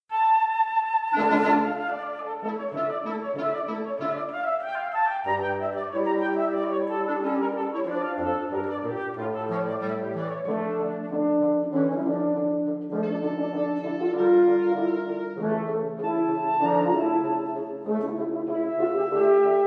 Quintet

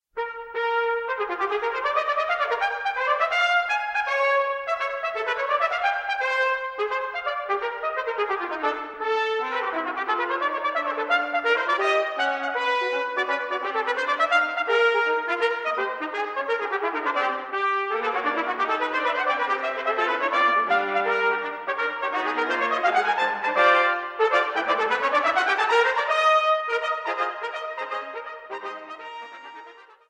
Five Trumpets; Reynolds: Music for Five Trumpets; III. Finale; CRYSTAL RECORDS

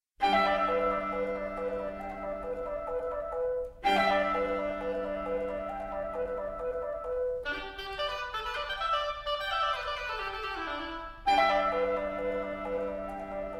Suite for Oboe, Clarinet & Viola Allegro

